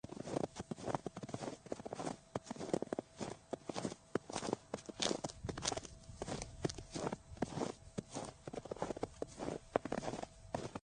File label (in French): PAS; MOBICLIC150